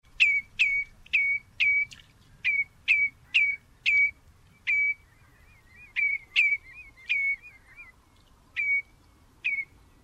redshank

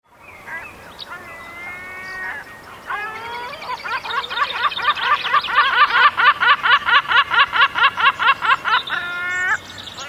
lesser black backed gull

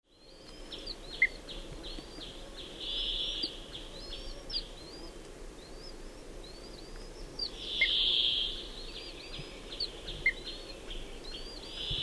wood sandpiper

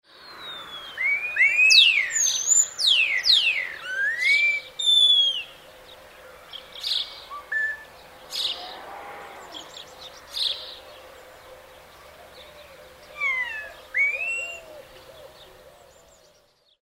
spotless starling

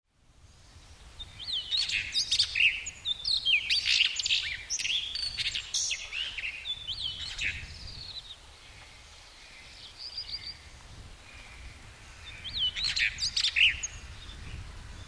blue rock thrush

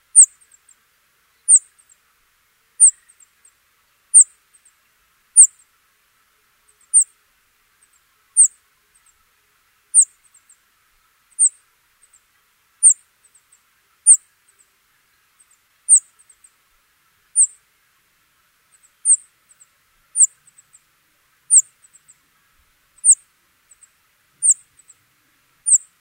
rock bunting

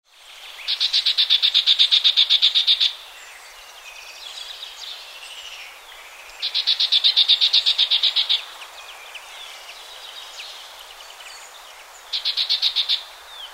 krupers nuthatch

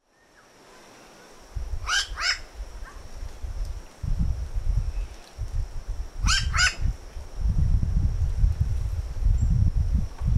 new caledonian crow

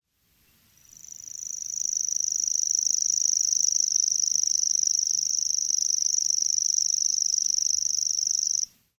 grasshopper warbler; Barwick, Biebrza National Park, Poland; Territorial reeling song of a bird perch; 53º 22' 14.5'' N 22º 33' 32.4'' E

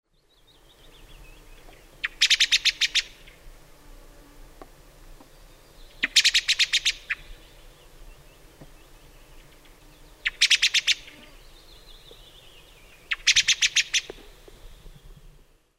song thrush

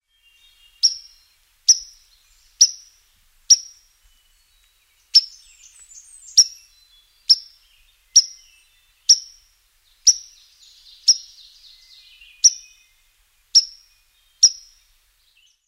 white throated sparrow

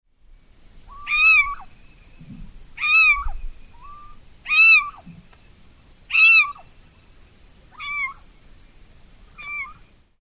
little owl; la serena

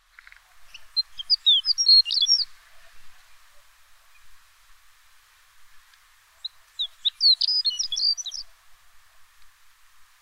shore lark

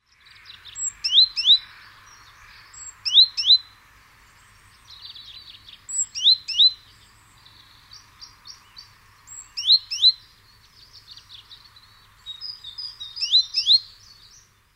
great tit

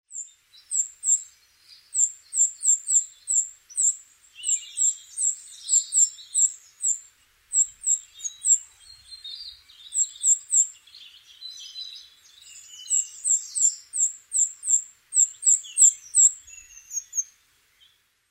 blue tit

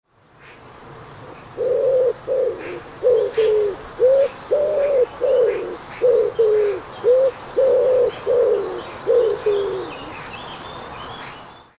woodpigeon

